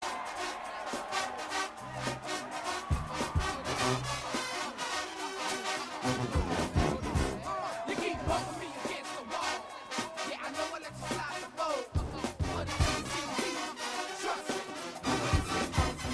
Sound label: Drumline